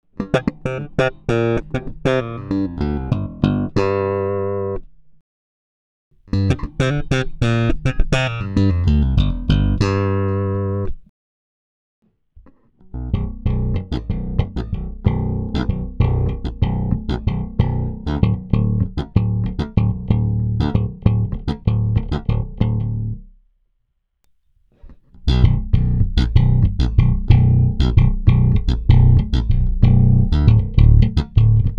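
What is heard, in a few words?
slap, bass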